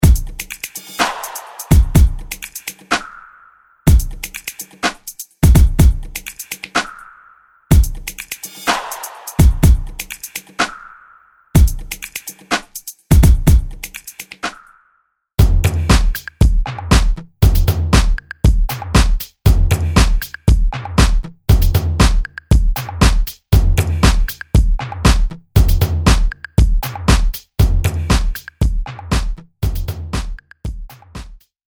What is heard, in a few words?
shock drums